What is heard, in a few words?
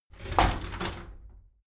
shower slide door inside open heavy halfway